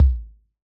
Tom, Yamaha DJX 2B

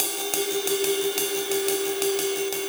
Cymbol Particle; Particles

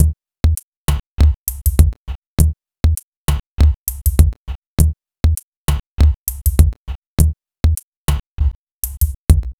Struggle Beat, Electronic Beats; Sonic Foundry (Sony Creative Software); Myths of Technology

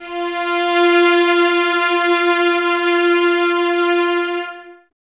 violas F 4, String Ensembles; Miroslav Vitous; VLS 1+2

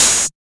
Open HH, Cymbals

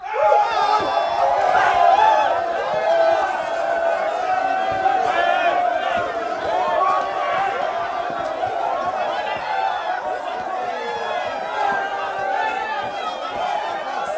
AF FIGHT, AF.CHEERS; Spectrasonics; Heart of Africa 1

